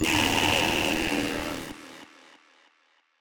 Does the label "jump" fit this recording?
Yes